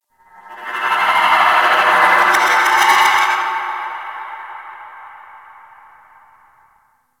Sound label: Misc One Off, Ambience; Warframe; Tenno